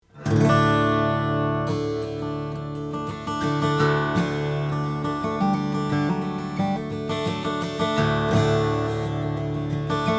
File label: rainsong graphite guitar ws1000 black graphite